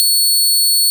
Fingered Bass C6

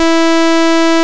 Saw Bass Wave C3

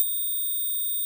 Small Bell

